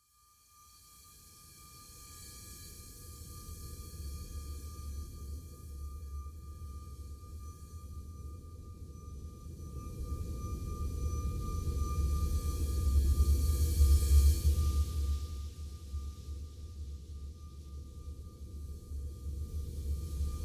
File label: ambient; X-Bow Software; 2004 - Star Wolves